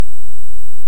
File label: Hydrophone; OneOcean